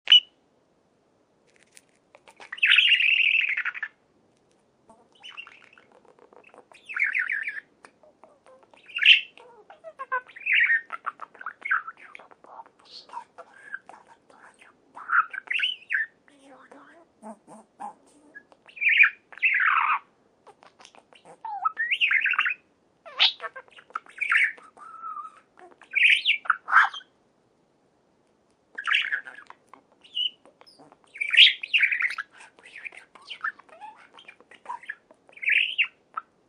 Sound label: Budgerigar song